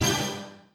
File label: prize hit; Wazdan